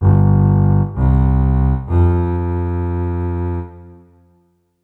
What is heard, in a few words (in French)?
CONTREBASSE; Mobiclic46